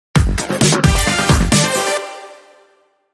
Sting

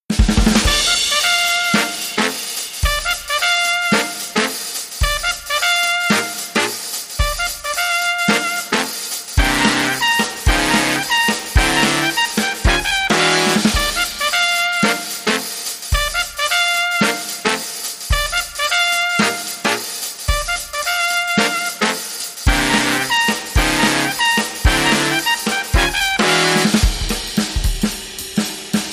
Horns & Drums

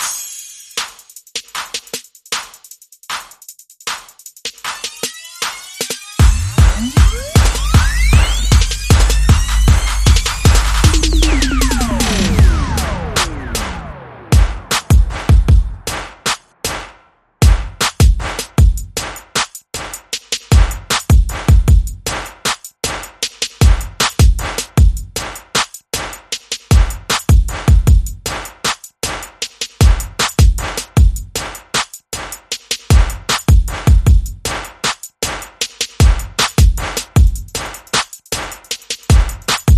Drums Bass SFX Only

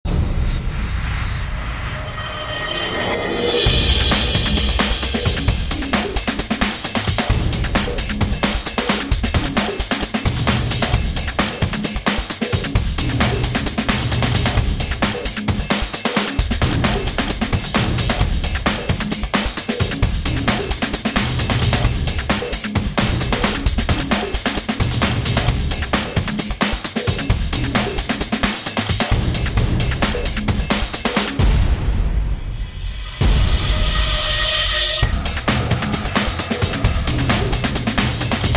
Drums Percussion

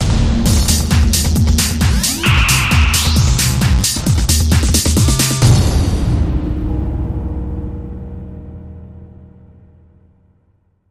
Electronica Rampa

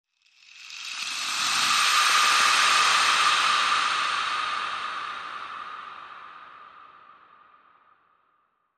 Placid Lake Rainstick Whoosh

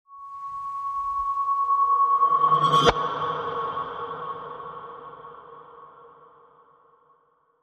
Cityscapes Glass Reverse

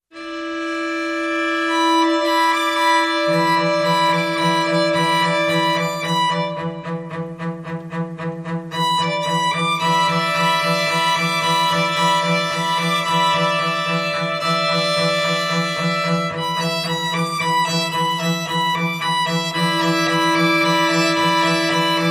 Strings Only

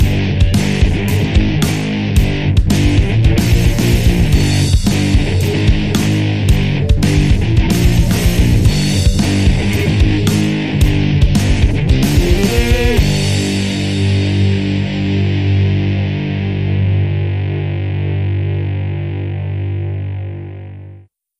Rock Fuzz Machine

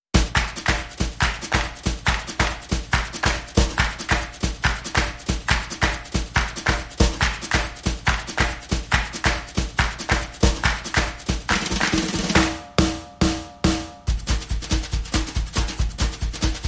Drums and Claps

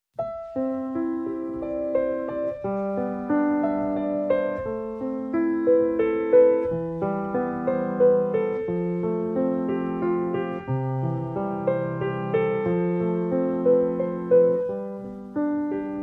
Abendlied, Piano & Cello

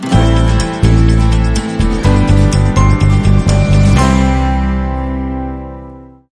Warmful Indie Anthem, Sting

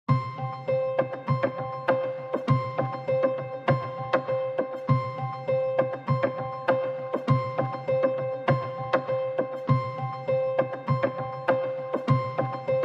Synths

